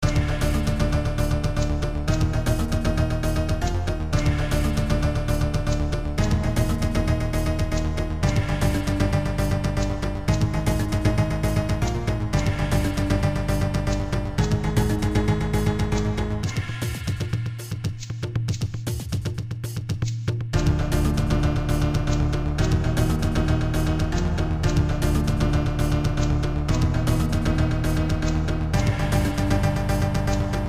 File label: electric techno remix; Super Fighter